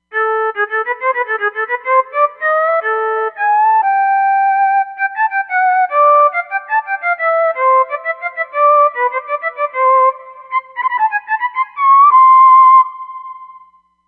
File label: Violin Lead